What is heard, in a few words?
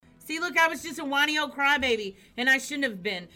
whiny crybaby; thekeymom